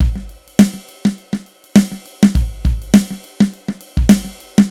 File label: Wooden Kit, V Drum; Sonic Foundry (Sony Creative Software); Electronic Point Blank; Drums & Percussion